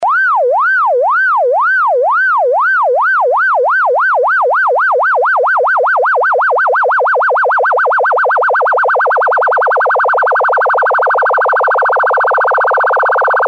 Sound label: varying sweep rate